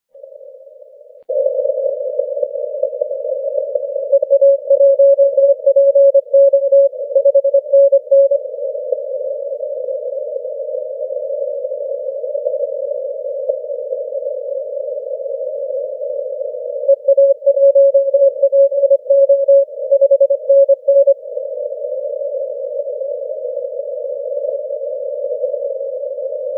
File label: 30m cw